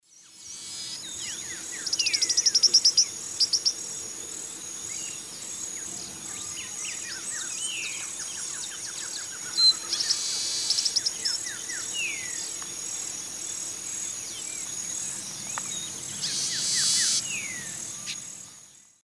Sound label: Sturnella militaris, flight female calls and male song; S. America; Eastern Lowlands